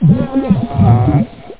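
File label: drown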